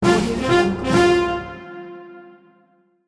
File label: army trumpet